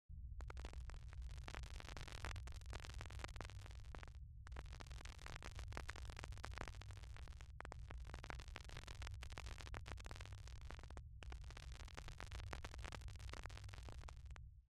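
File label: Vinyl crackle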